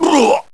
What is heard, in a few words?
duwaah; sagat